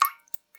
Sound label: Drips